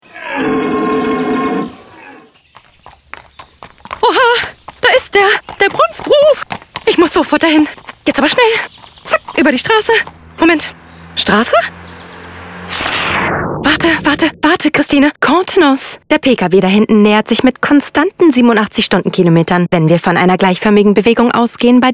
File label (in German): Hirschruf